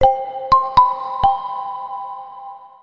Vivo Y17S Notification Ringtone

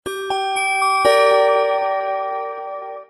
Vivo Y100 SMS Ringtone

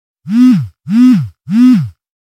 Vivo SMS Ringtone; V29 Pro

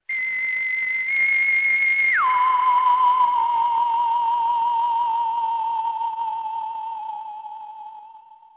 Sci-Fi

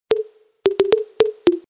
Vivo Y27 5G SMS Ringtone

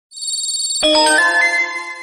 Realme GT5 240W Notification

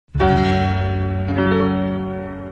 Vivo iQOO 12 Pro Notification Ringtone

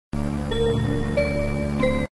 Sms Ringtone

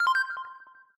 Samsung Galaxy A14 SMS

